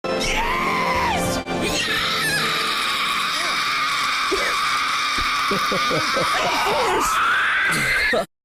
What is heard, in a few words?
victory scream; psychicpebbles